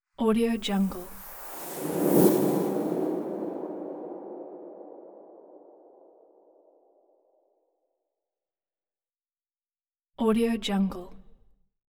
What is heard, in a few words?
thin incoming high stinger; hollywoodedge